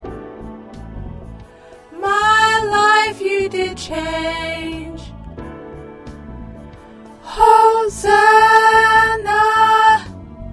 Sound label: Alto, choir parts